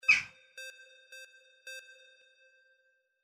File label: CROW FORREST